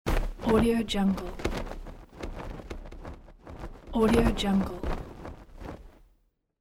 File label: flag in the wind